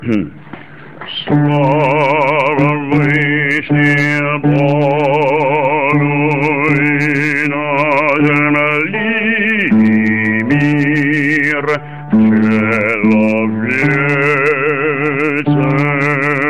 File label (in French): basse; slava v vychnih bogou